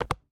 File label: Magnet; Game rip; MiSide